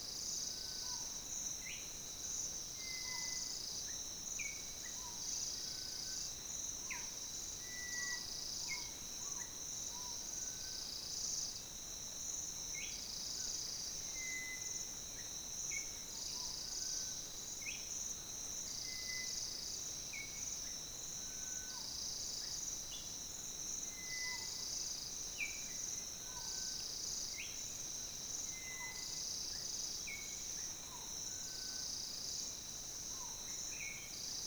Insect and bird chorus Gabon

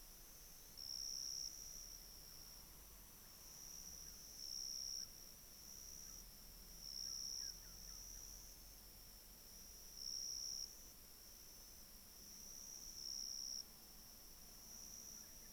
Diurnal shrubland New Caledonia

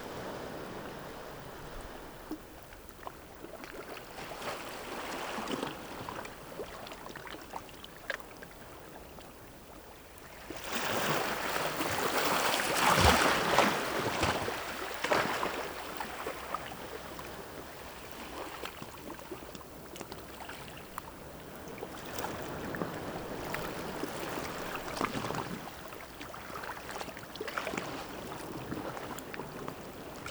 Waves on rocks

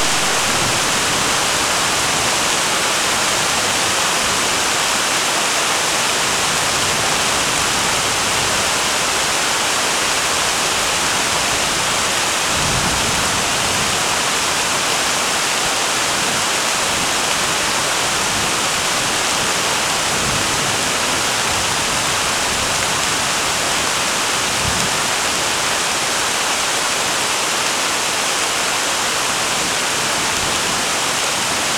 Rain in forest Brazil

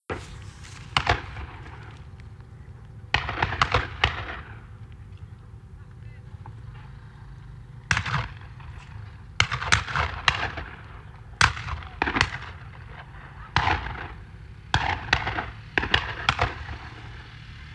Shotguns

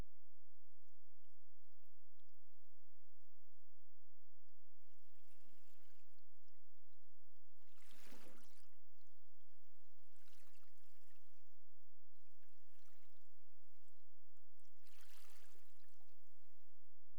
Waves underwater

